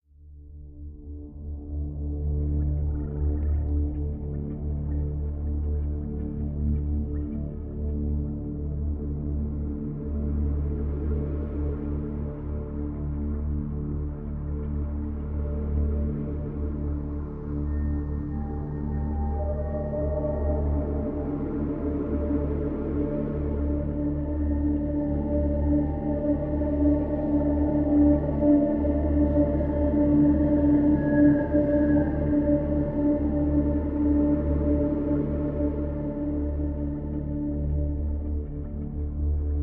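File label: Drone (D)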